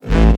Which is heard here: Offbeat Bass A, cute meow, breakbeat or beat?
Offbeat Bass A